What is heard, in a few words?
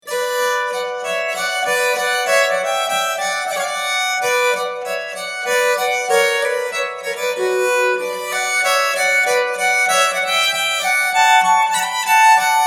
polska, nyckelharpa; efter Stare